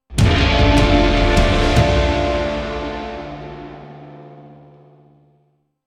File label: Sting Challenge Fail